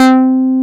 MOOG, Source Bass

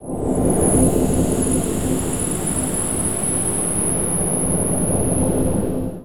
INTERSTELLAR BUZZ DRONE, DEEP ATMOSPHERICS; Sonic Foundry (Sony Creative Software); Vir Unis Infusion

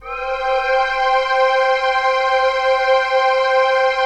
CHRD PAD; Best Service; XX Large Pads; Sample CDs

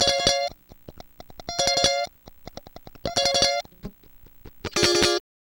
Guitar Licks

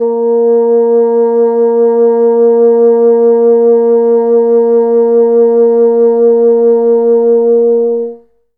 Bassoon A#2

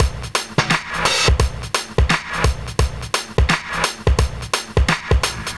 Safety, Drum Loops, Compressed; Zero-G Smoke

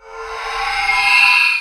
REV, SOUND EFFECT REVERSE; AKAI S6000 CD-ROM - Volume 1

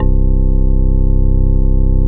METAL ORGAN; Greytsounds; Scott Peers Sound Engineering

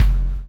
CHAIN; Spectrasonics - Backbeat

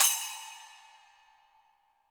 HiHat & Crash, Roland TD-20; HiHats

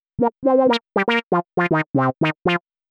120 synth licks C, Keys, Nu Jazz House; PowerFX

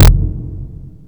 Bassdrum short, Moog mg-1

